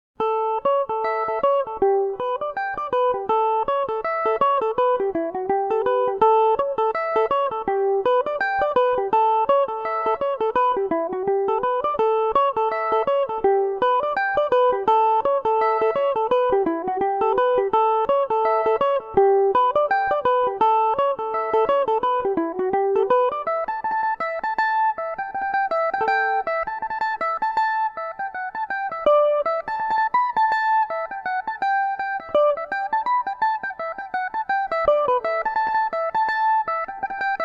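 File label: A mixolydian, irish mandolin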